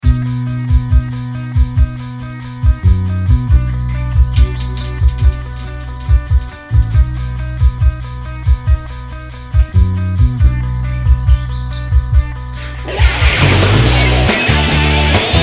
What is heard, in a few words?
Ha Ha Boom, rock